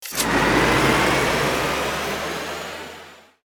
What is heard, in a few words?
electric bicycle speed up